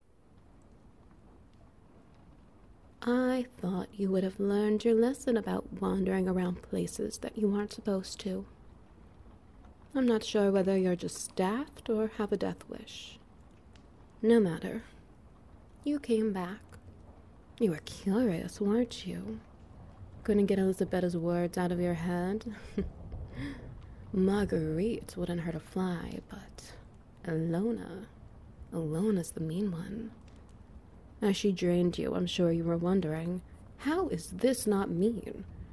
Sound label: Ambience SFX Wet Noises